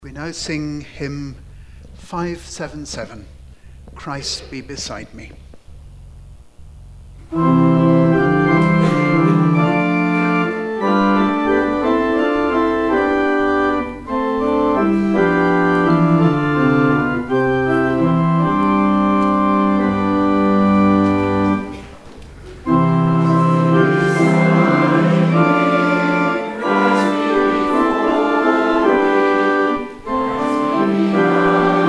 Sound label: hymn; Hymn 577 - Christ be beside me